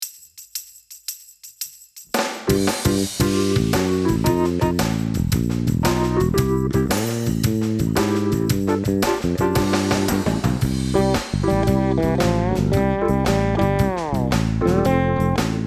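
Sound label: HYBRID, bass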